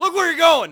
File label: yell; Matt Hoffman's Pro BMX 2; XBOX